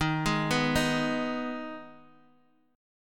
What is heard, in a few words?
D sharp Suspended 4th Sharp 5th D sharp; arpeggiated; JGuitar